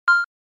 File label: Beep Alert; Sony